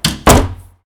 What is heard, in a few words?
door close; Golden Crayon Sounds